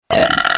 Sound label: Burp; Rabbids Go Phone Again